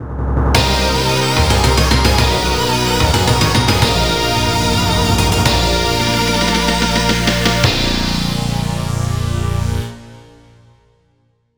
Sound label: Boss Clear; Cyber Squad